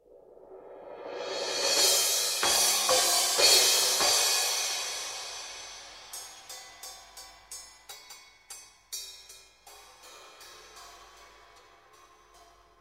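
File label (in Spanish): Platillos, Percusión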